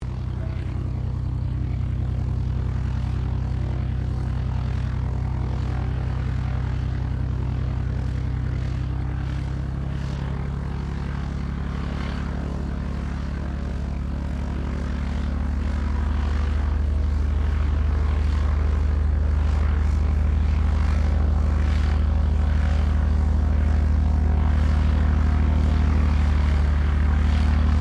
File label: AIRCRAFT CESSNA O-1 BIRDDOG PREFLIGHT, spotter aircraft; Airplane; Vehicles